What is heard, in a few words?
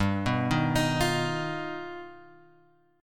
G Augmented 7th; G+7 Chord {3 2 1 X 4 1} arpeggiated